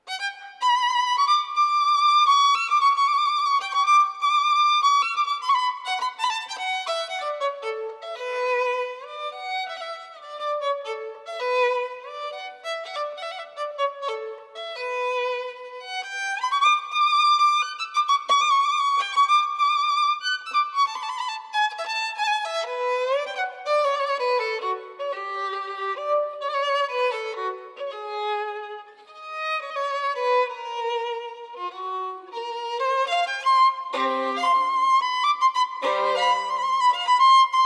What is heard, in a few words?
Lautarul